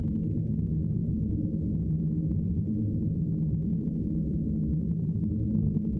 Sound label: Tonal Re Draw, sparse soundscape